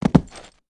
Pet Elephant Digs; creatures pets

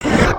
run, creatures organic

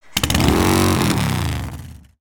diff MOTO rev start; aronwk collection; ndaudio

